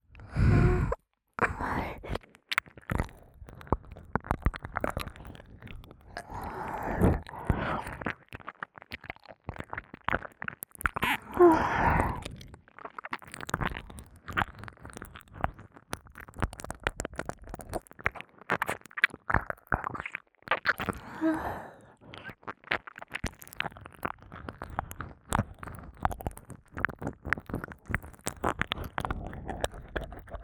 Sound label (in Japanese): (右)耳舐め ぐっぽり; 耳の奥底で蠢いて～舐め音に溺れるバイノーラル～; 舐め音特化